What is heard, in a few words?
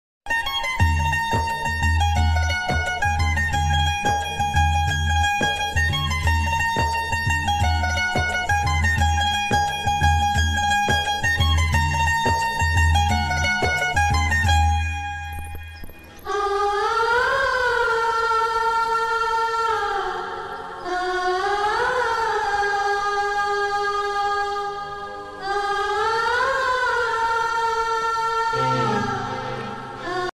ringtone old hindi song music ringtone